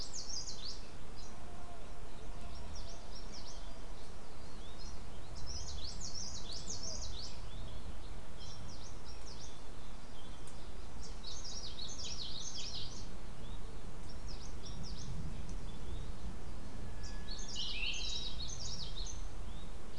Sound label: mainly bird